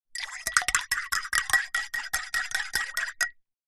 household teaspoon stir tea or coffee in ceramic cup mug fast